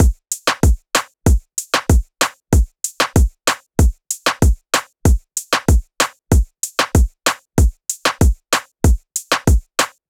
Reggaeton Kit 95bpm Full, drums; Drum Kits; Stems; Ghosthack - Ultimate EDM Drums; Reggaeton Kit 07

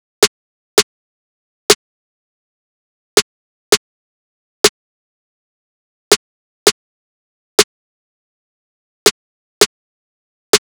Drum Loop 163 BPM Snare, Trap; Blizzard; Cymatics; ANALOG EVOLUTION Beta

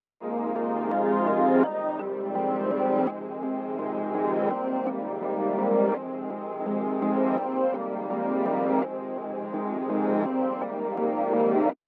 167 BPM F Min Gloss Pad; Melody; Reverse; Stems; Cymatics; 2022 Melody Collection (BETA); Omniverse

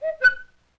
LoFi Cuckoo; Vintage Cartoon; Foley

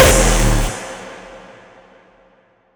impact oneshot; GhostHack

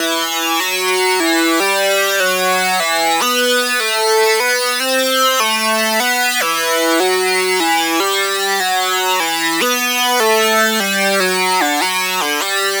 Lead Loop E Holding, Dubstep, 150bpm; Ghosthack; Dubstep Sound Arsenal

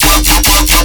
Bass Hit F, Dubstep

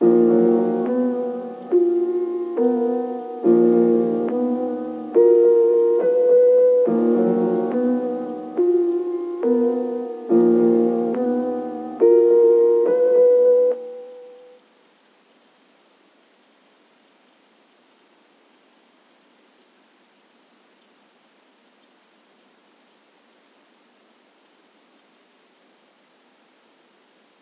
140 BPM D# Min Piano; Melodies; Loop Stems; Cymatics; 2022 Melody Mystery Expansion; Sheisty

